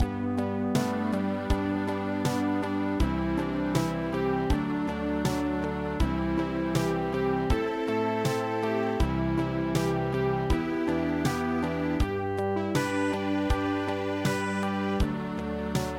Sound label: Ballade; Magaii Song